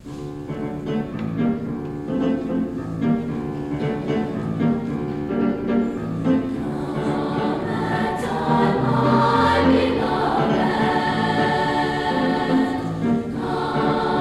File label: Three Spanish Ballads, chorus